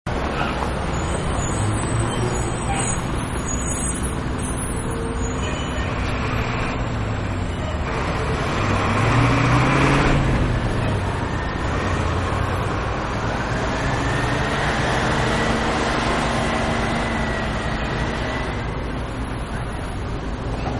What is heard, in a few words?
Traffic Heavy; Trains; Vehicles; Manhattan Production Music